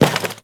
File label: Worker Step Default; Warframe; Characters; Solaris